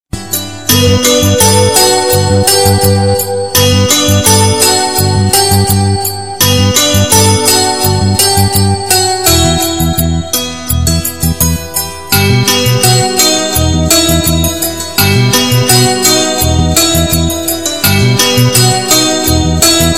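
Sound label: Taal Incredible Flute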